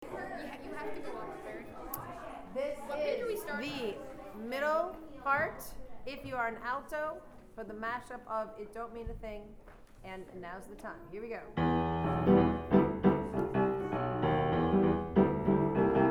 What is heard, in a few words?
middle alto